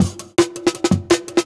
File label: Jungle Breaks